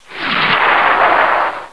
bomb; hedge

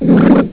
gurp; citrusfrog

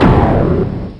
door end; lmctf

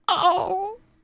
death; openffa; monkey

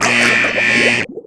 DROWN; TERMINATOR

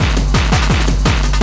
rave

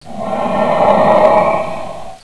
breath; lmctf

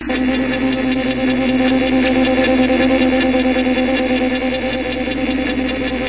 engineering

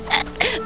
gurp

